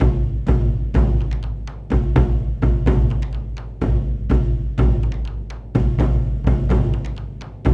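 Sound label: 125 bpm, daiko, low drums; Spectrasonics; Supreme Beats; World Dance; Asian Daiko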